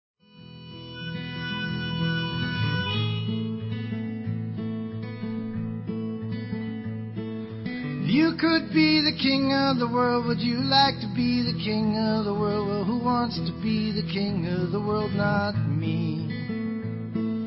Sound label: Acoustic